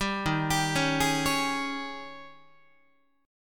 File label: Eb 11th Guitar, Eb11 chord; arpeggiated; JGuitar